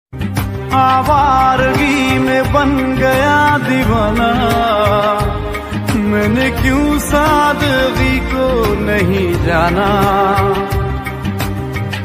ringtone sad broken heart

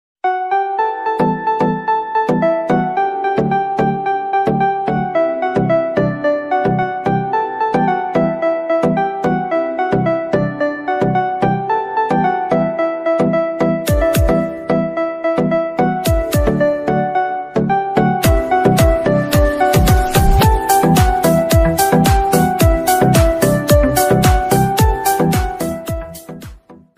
instrumental ringtone flute ringtone; i phone ringtone; sms ringtone

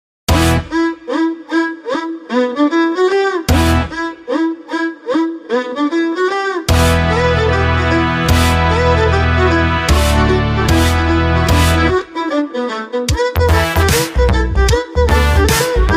violin music version

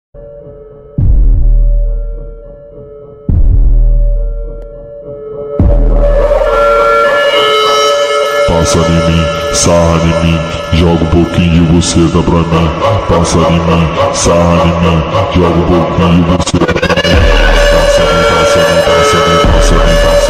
english ringtone love ringtone instrumental

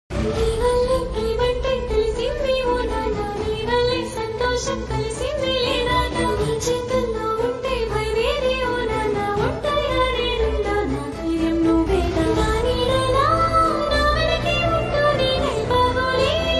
telugu emotional song

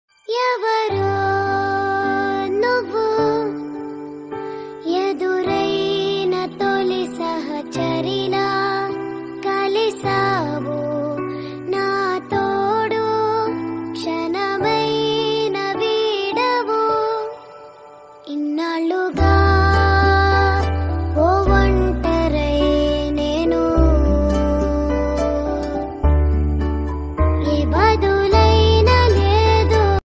sad ringtone; 777 charlie

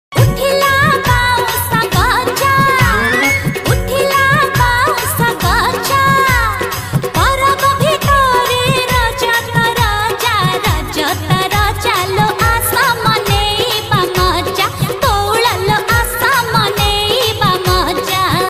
odia song

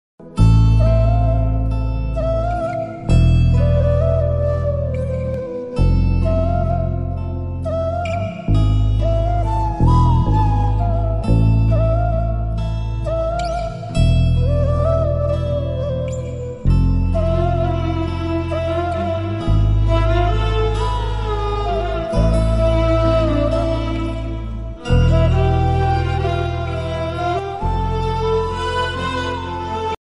movie bgm ringtone romantic ringtone; RX100 movie

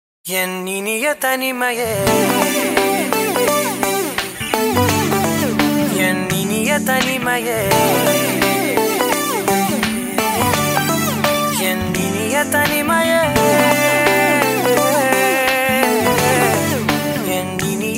ringtone bgm

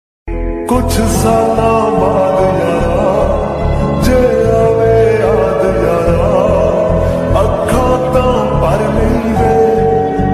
sad songs ringtone, romantic ringtone

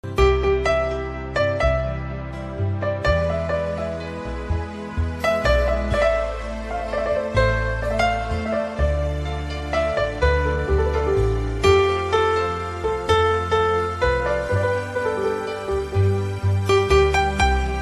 ringtone instrumental piano

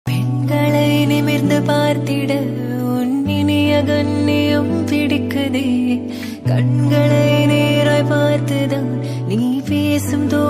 love romantic ringtone tamil

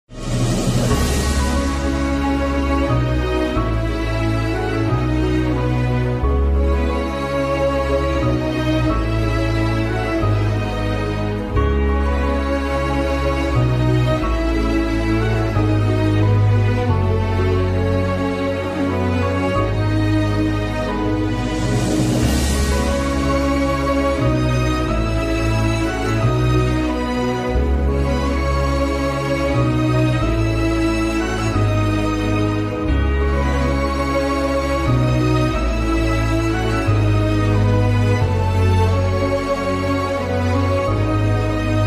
emotional bgm ringtone

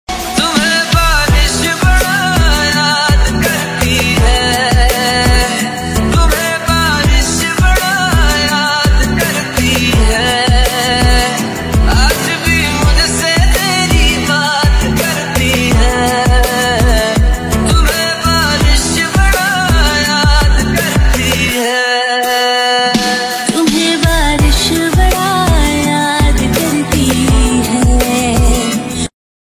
music tune ringtone

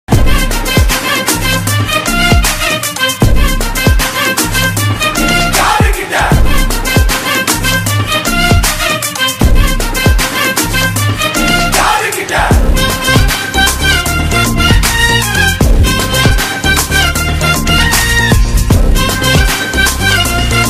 song bgm ringtone; thalapathy vijay

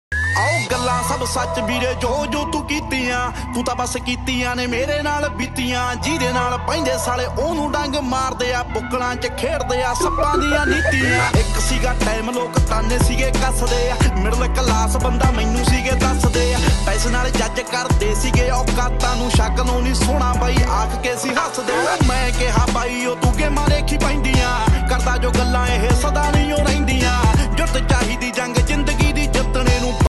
punjabi song

